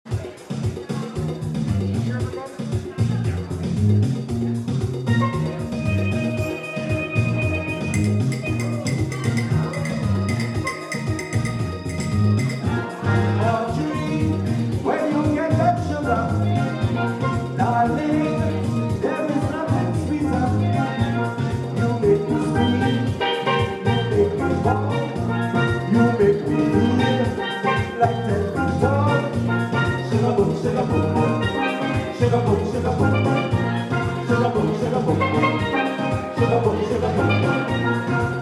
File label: steel drum band